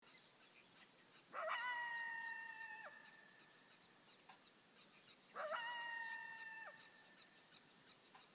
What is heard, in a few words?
coyote sound